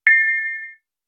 arrived, message tones

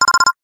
hurry; standard ringtones

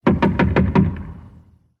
door knock; wake-up tones; open up door knock

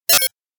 mishap

